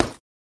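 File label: Boxing Bag Hit Short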